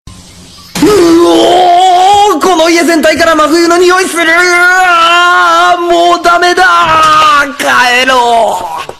ringtone; uenoyama

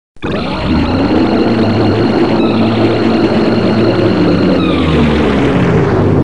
cry; lavos

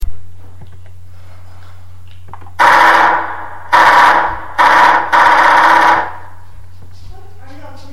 machine gun

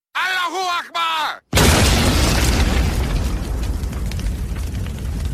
alarm clock; palestinian

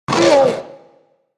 raptor call

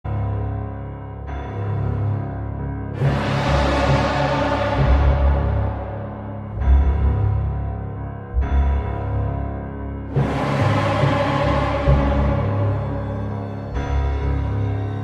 horror ringtone

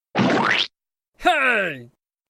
splat hey

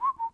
short whistle

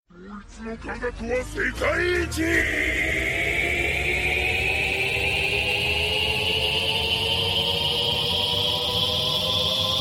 powerup; stroheim v3

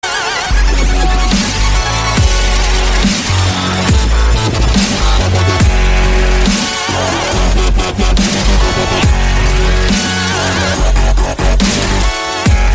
dubstep; megaclown

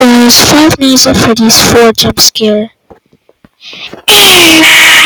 jump fart

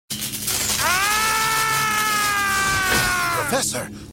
funny scream; professor xavier

